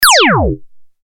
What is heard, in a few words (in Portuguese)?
arma laser